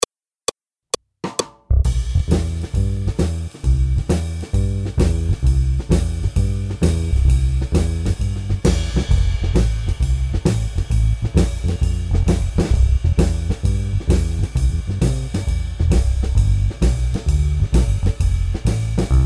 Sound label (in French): Daily boogie Accompagnement seul, piano